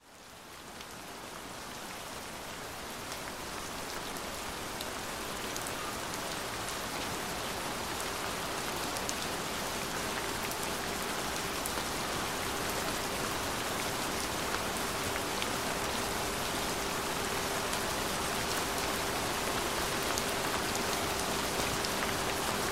Solfeggio Frequency 396 Hz Tone Relaxing Rain Sounds